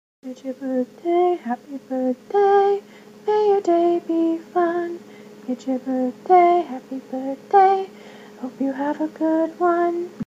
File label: Happy Birthday Song